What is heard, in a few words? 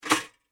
Kitchen Toaster Loading